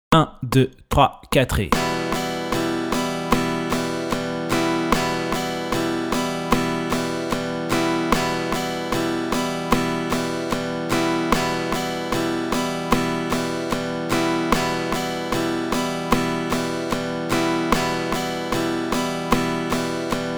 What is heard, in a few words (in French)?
Flèches vers le bas 150